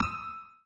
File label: blink; Be Cool Scooby-Doo! - It's Dark Out There; ThrillGames